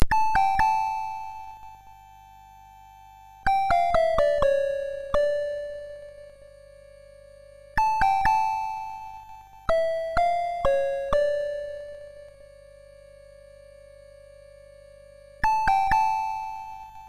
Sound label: Toccata, MOS6581R2